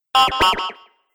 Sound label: Droid Goodbye Bleeps, robot sound effects; An Essential Sci-Fi Robot Sfx; Andrew Fleming